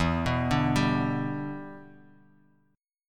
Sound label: E Minor 7th Bass Standard; arpeggiated